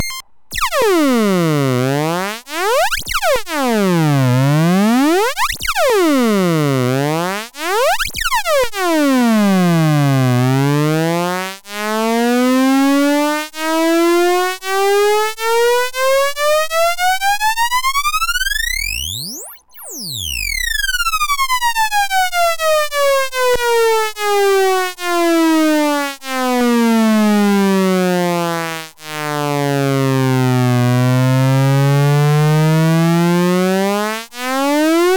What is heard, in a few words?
detune